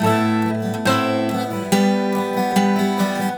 GTR, Celtic Flavours; USB Soundscan vol.42; AKAI

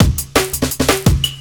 FRACTURE BREAK

